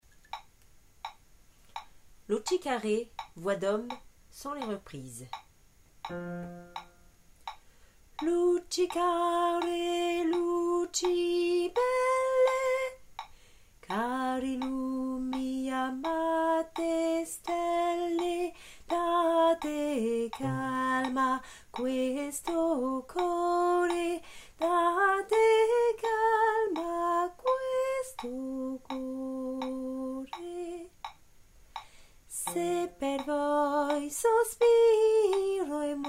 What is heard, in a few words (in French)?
hommes; luci care